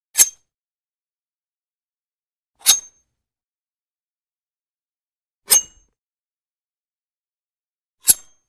Knife Draws Fast With Metal Slide Shing, Metal Shing; Hollywood Edge Foley Sound Library